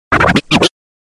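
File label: mixer SMS, funny ringtone for SMS message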